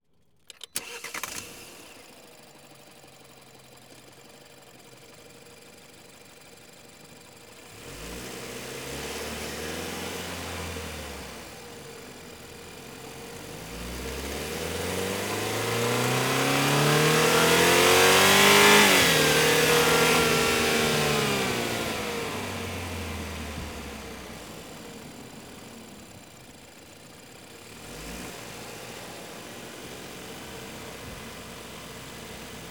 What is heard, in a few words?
BMW Driving Extreme Fast, BMW 320i; 2014; DPA4061; Secret Source